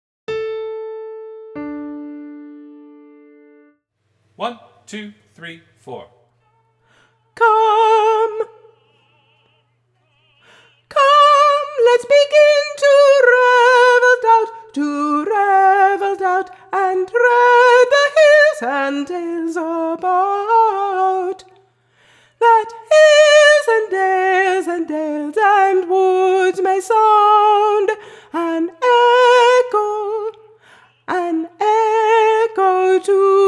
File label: Come Come Lets Begin Alto